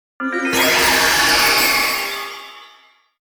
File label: 3DS Menu Jingle; Pokémon Moon; Pokemon Sun Moon Ultra The Definitive Soundtrack